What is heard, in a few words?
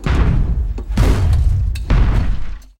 Doorknock Huge; Silent Hill Homecoming